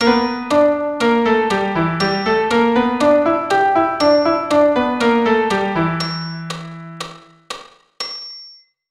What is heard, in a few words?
E blues, keyboard